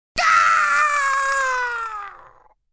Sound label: Man Scrm Gaa, A man screams; Screaming; Voice